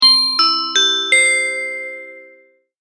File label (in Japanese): アナウンスチャイム